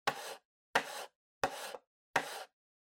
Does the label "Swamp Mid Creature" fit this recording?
No